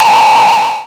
Synths G#; Vengeance Samples

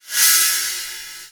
Cymbals Reverse Crash; Vengeance Samples

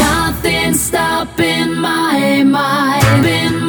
Root C# 130 BPM; Vengeance Samples; VEH3 Special Sounds

